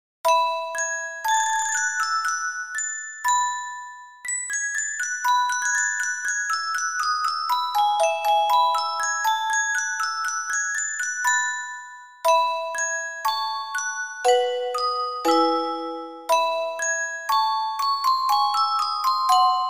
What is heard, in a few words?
gavotte; オルゴール チェレスタ ミュージックボックス; 無伴奏ヴァオリン・パルティータ第3番より ガヴォット